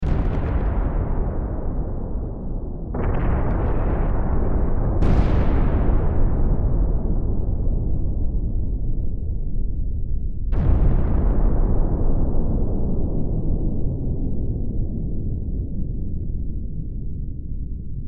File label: Explosions Distant Ambience Battlefield War Loop; Fire; Military; Warfare